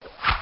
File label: arrow Shooting; PlayfulTreeKingdoms